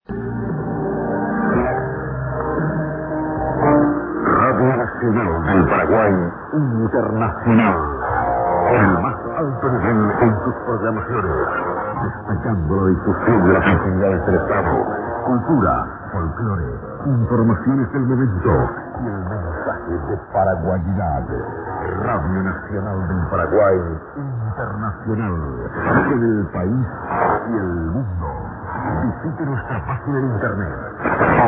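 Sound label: radio, 9737 kHz; RN del Paraguay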